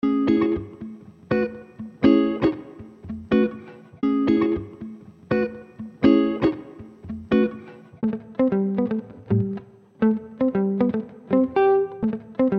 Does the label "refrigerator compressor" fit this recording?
No